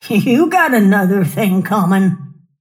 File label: cast pain; snapfire